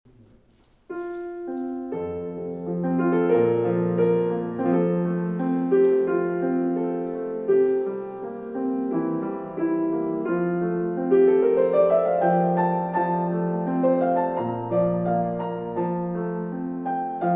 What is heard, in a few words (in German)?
Klavier; My Jesus, I love Thee